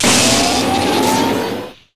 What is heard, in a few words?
charged